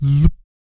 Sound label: VOC PERC, Vocal Planet Jazz FX BASS PERC